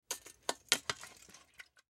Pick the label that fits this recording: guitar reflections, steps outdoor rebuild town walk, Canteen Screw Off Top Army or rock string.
Canteen Screw Off Top Army